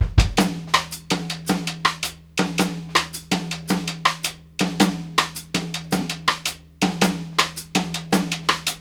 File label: 6 8 FEEL, Drumworks; Sampleheads; New York City Drumworks VOL-1